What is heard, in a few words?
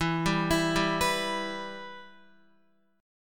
E-Minor, Guitar; arpeggiated; JGuitar